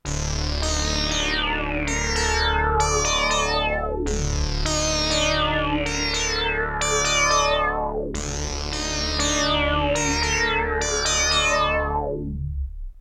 Polysix Poly

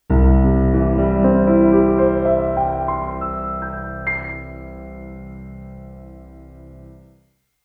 GATE REVERB FX; Zoom L12

